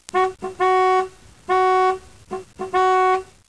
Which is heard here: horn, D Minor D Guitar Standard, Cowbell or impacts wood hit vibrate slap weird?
horn